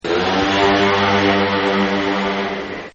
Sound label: Fog Horn Blast, boat; Vehicles; Partners In Rhyme